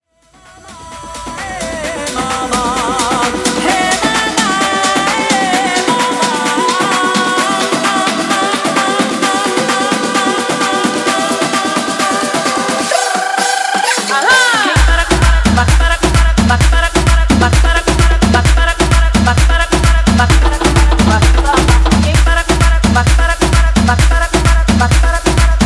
guaracha Remix 130 bpm

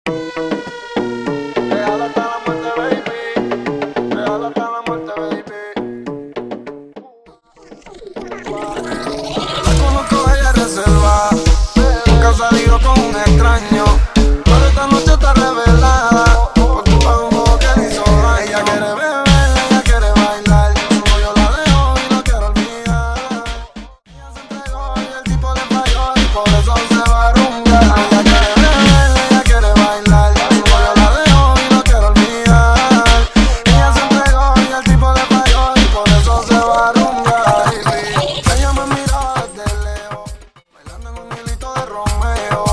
Remix 100 bpm

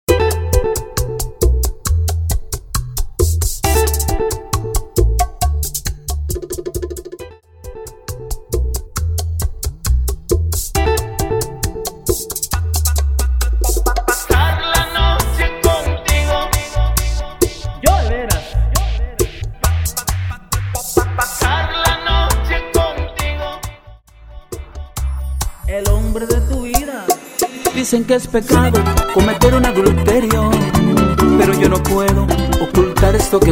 intro outro breakdown 135 BPM

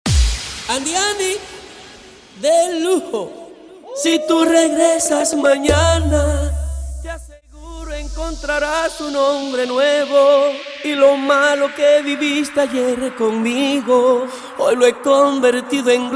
Acapella Starter 128BPM

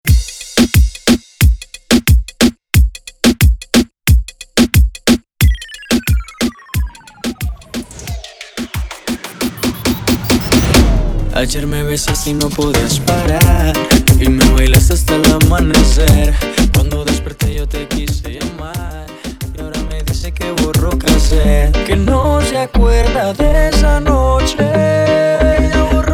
Intro Break 90BPM

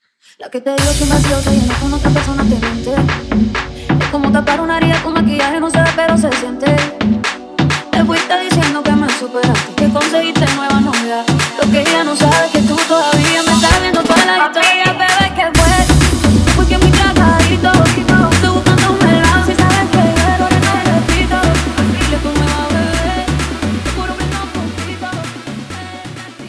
tqg intro outro 130 bpm

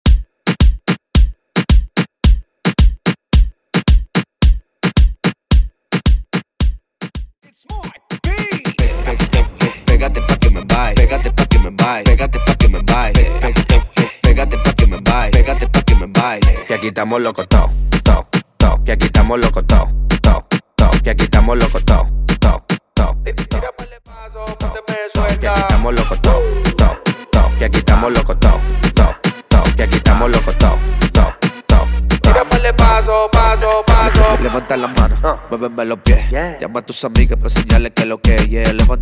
Intro Outro 110 BPM

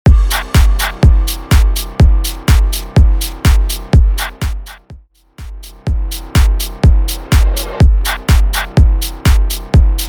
Intro Halloween Tone Play 124 BPM

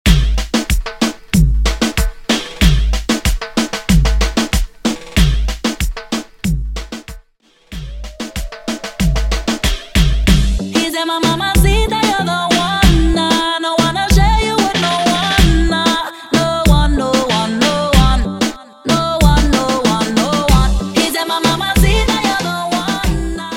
Version 94 BPM

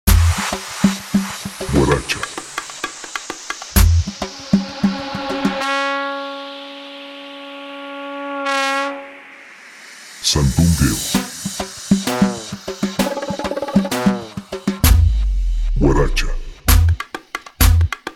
GUARACHA SANDUNGEO 130 BPM

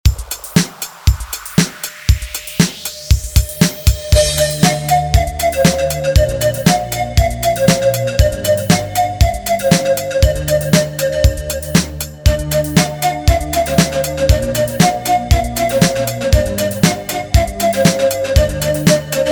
intrumental 118bpm

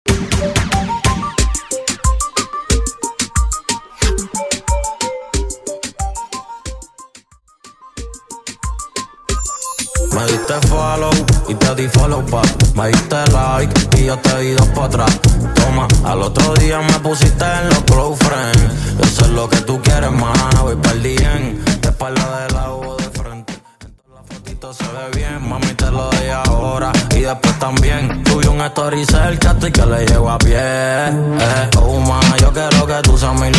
Intro Outro 90 BPM

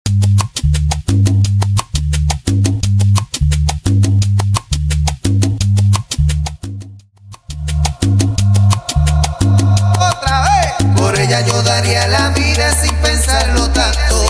Intro Percapella Outro 87 BPM

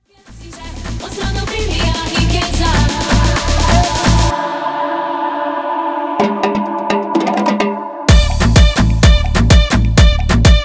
afro remix 127 bpm

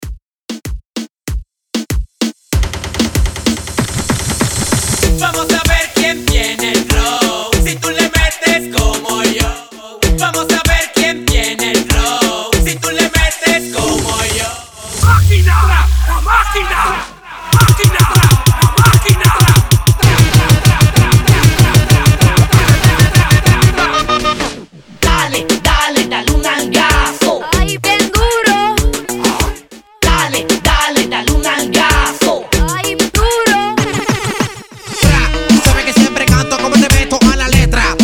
out acapella 96 bpm; dj peligro

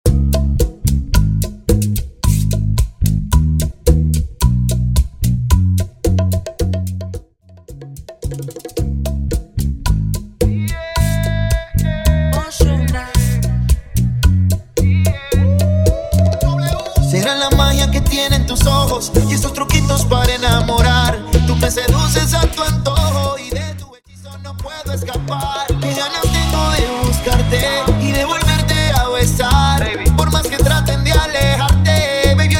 Bachata Project 110 BPM; bootleg bachata remix

